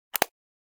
camera; NCH Library

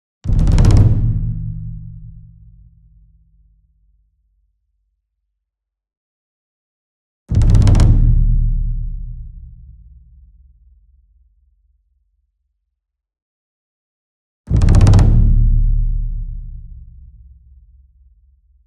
ENSEMBLE DRUM Taiko Roll Near; boom library; Cinematic Strikes Construction Kit

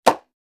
paper hit; NCH Library

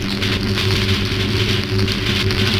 Sci-fi Electricity Hum Loop

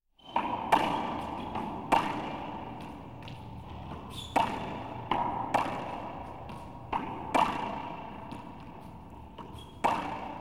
Squash General Atmosphere; KMP Film Video Sound Effects

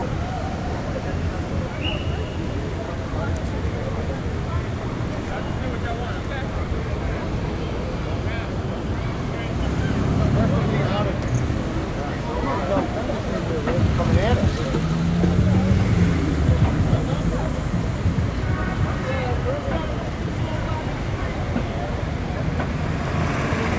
city people bus whistle, street traffic; best service Blue Box

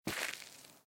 footstep gravel; A Western Drama

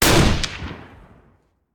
Rifle; Sound Ideas; Guns

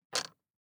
DICE on Glass, Grab, Standard, 6 Six Dice; Samples; ArticulatedSounds; DICE Sfx Party (Extended)

